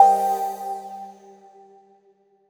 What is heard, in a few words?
Cmin collision; Color Zen Kids; 3ds android ios mobile switch wii u gamerip 2013